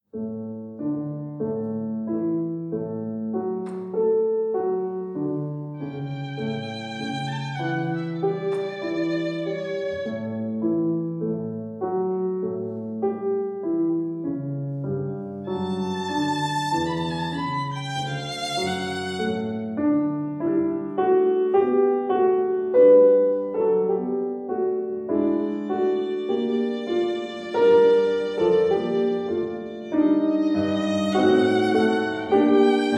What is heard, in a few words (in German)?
Andante, Violine Klavier; KV526; anfang